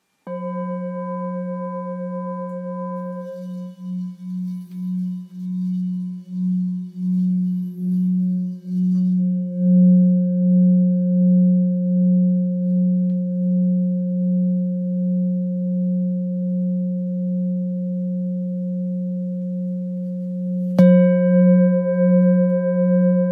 Bol thick fa diese

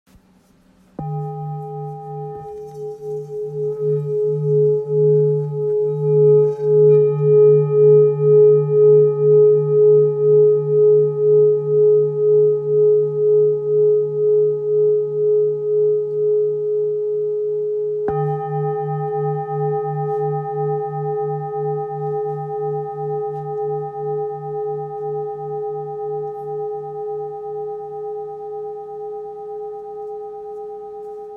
Bol ancien re

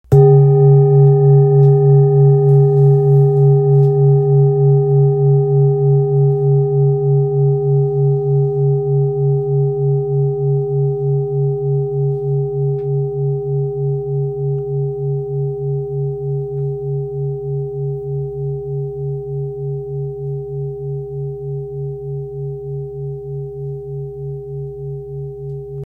bol therapeute do diese 930g

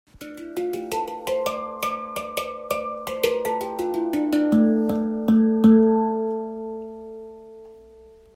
9notes aeolien

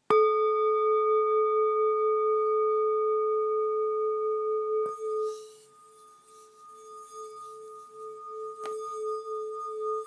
bol bengale 2-300g Re dieze

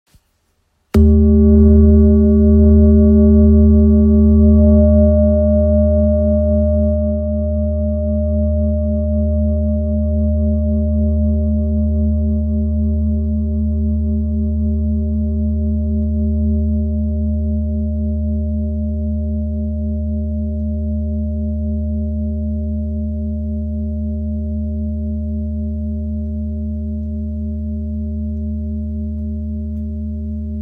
Bol ramgandi re

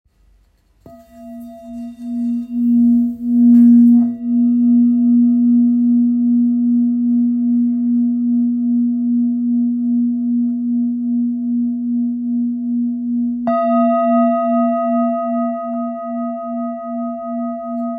Bol grave tara la diese

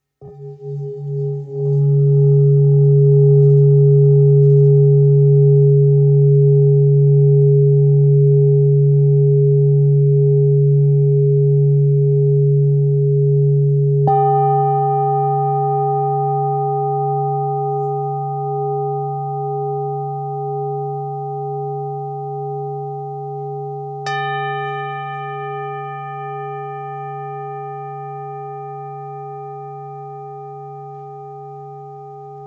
Bol sol diese 1.38kg; super lune belier; Bol Chantant Full Moon

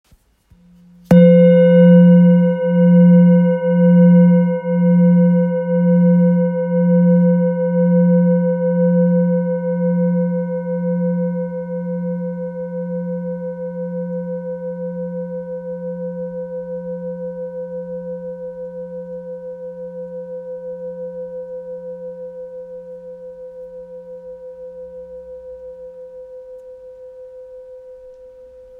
Bol therapeute do

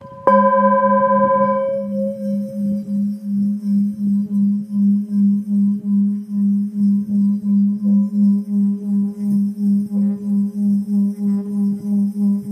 Bol Ancien Note Si 335g

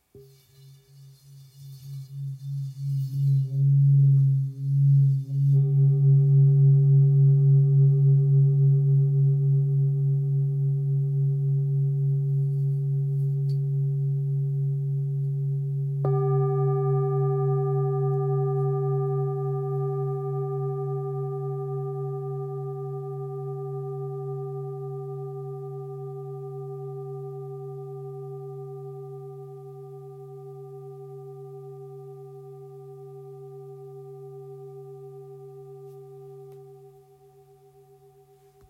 Bol full moon do diese

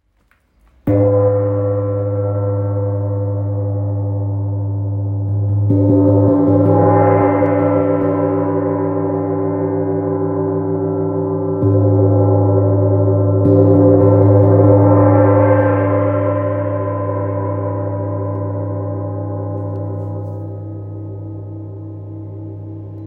Chau gong 60cm mi

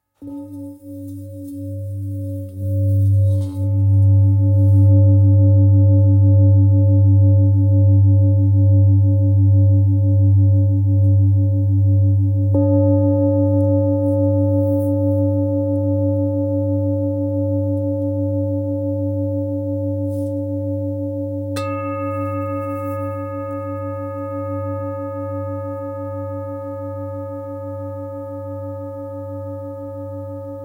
Bol Bengale 2.3-2.4kg re

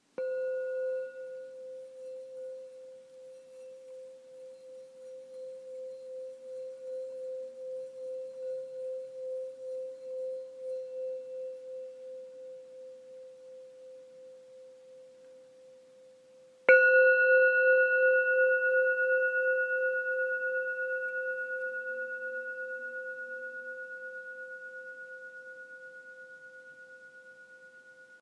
Bol bengale do

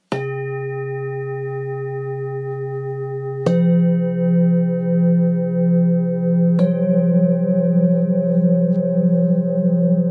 Set 3 bols thick planetes

